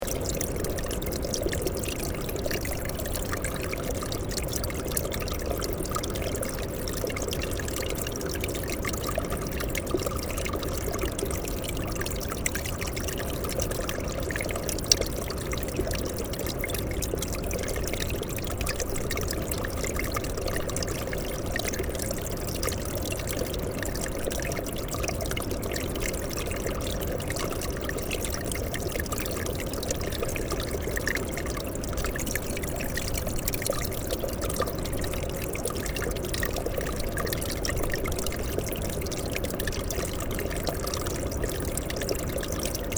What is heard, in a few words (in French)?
clapier; Carrelet